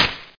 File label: TREE; links386